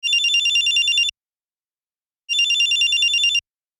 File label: Old cellphone ringing loop